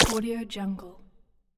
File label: water hit